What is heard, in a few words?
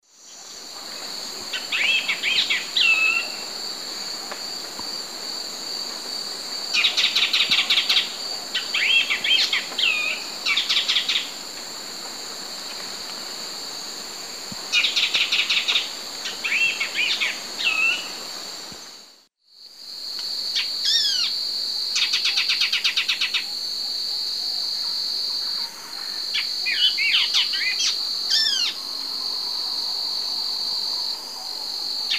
Microbates cinereiventris, calls; S. America; Eastern Lowlands